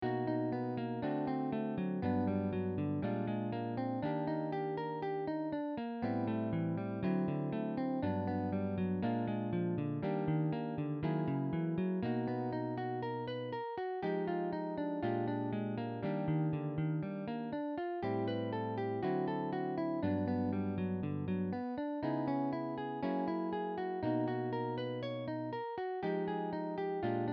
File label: arpeggios